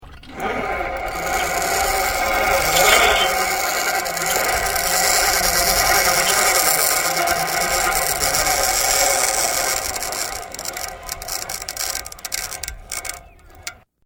Flying fox Travel Slowing Loaded, Playground equipment; Sports